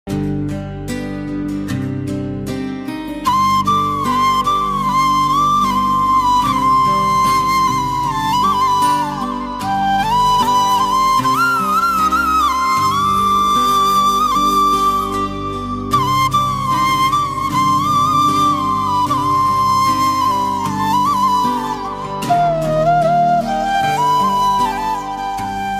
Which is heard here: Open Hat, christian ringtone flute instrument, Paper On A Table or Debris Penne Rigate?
christian ringtone flute instrument